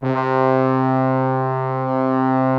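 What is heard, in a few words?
BRASS C2 right, ORCH BRASS; Greytsounds VOL-1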